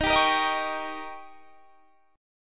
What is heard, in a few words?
Eb 7th; strummed; 23,22,20,20,20,21